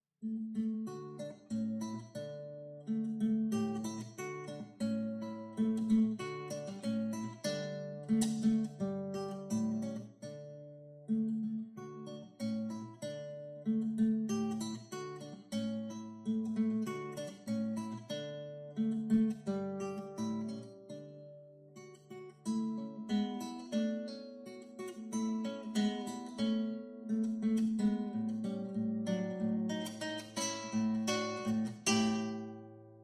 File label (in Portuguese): violão, allegretto; Molino